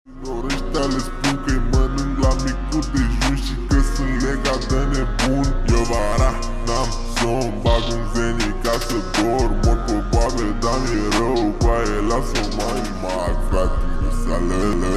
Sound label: slow